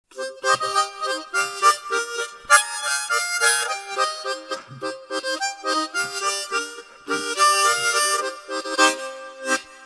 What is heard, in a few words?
MINOR HARMONICA Chords nat min C; Seydel